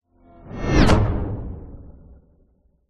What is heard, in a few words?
whoosh dark impact hard growl tail; sound design; zapsplat